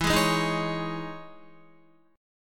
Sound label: E Major 13th down Guitar; EM13 strummed; JGuitar